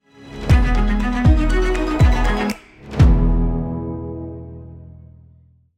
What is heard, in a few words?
sonic logo; atlassian